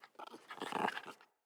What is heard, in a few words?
Kubrow Puppy Idle Pant Grunt